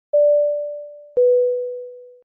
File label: Airplane Ding Dong